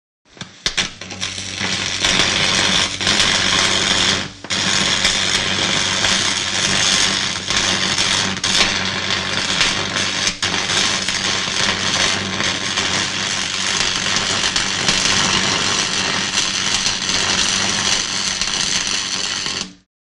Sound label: Arc Crisp Constant Ar, arcs & sparks